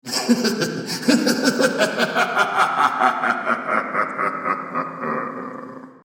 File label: Ringtone